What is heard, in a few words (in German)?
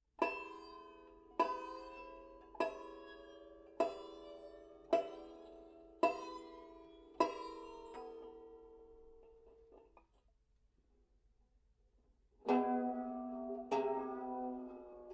bottelneck hintertoene, banjo